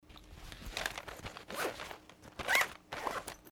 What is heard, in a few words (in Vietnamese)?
Tieng keo day khoa phec mo tuya cap di hoc